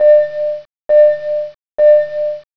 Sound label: 3bells; svencoop; keenhalloween